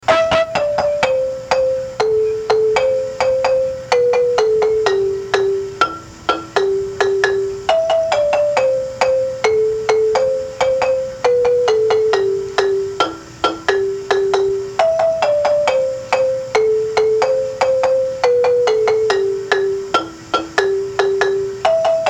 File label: lead main, marimba; manhanga